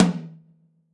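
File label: power room hi, Kawai XD-5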